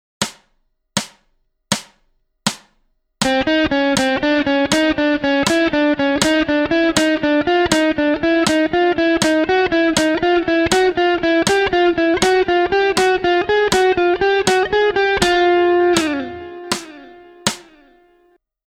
slow 80bpm

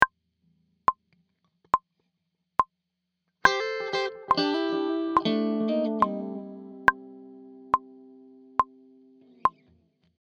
Lick

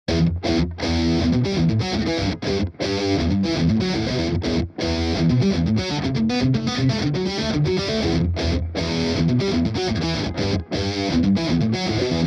main riff

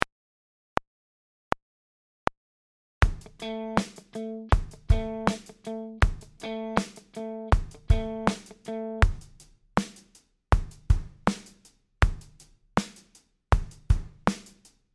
groove

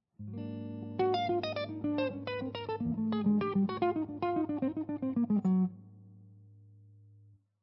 Lydian Dominant Lines

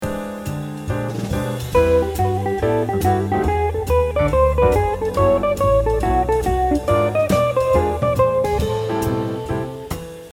Lick

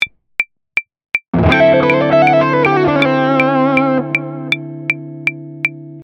Lick

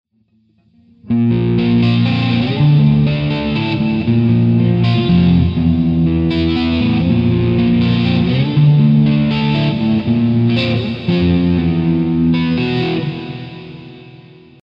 Sirens

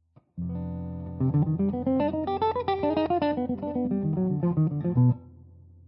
Lydian Dominant Lines

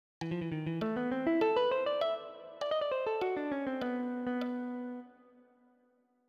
Lick

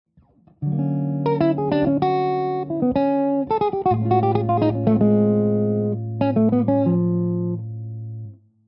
Lydian Jazz Lines; Lydian 5

